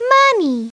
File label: Money; Treasure Galaxy; Learning Company